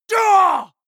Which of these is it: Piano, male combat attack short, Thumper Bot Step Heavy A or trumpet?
male combat attack short